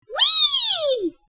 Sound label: hangman Game completed